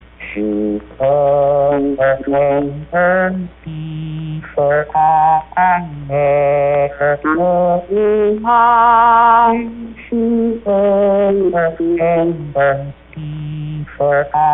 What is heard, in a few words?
Auld Lang Syne Song, voder